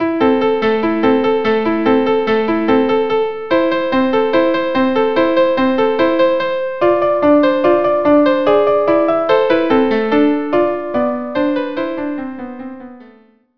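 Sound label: violin violin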